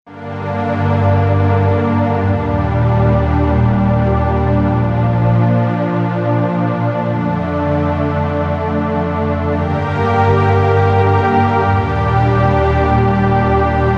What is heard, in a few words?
Underscore PAD; Worship Flow